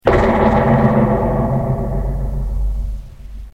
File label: thump